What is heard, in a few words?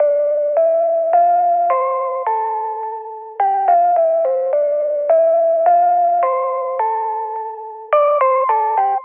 106 loop synth soft lead notes A min; vibez